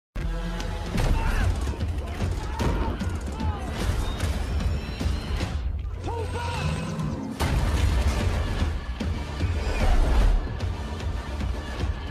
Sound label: Trailer Audio; Battlefield 6